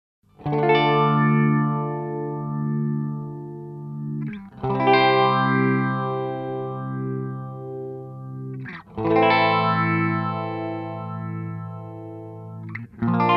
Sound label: Phaser Sound